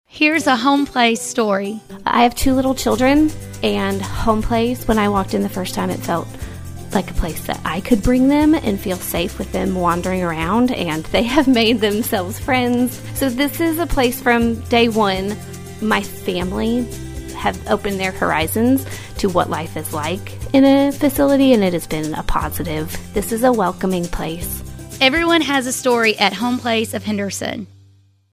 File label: CHILDREN